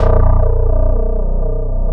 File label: ESQ 1 DIGITA, Synthesizers